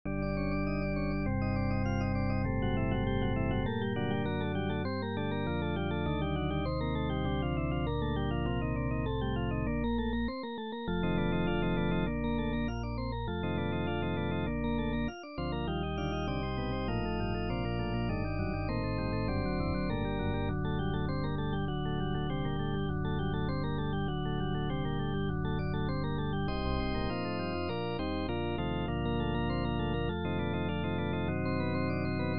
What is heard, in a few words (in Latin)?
praeambulum